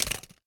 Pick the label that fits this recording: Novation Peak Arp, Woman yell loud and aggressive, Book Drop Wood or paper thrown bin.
paper thrown bin